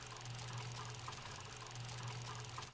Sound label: auto Play; king of slots